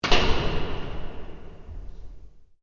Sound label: Door Metal Large Close; Various doors